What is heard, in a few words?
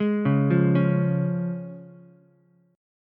C sharp Major, Ukulele; arpeggiated; JGuitar